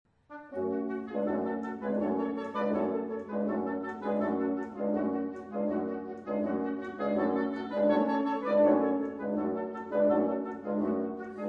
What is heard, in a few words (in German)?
Tanz der Rohrflöten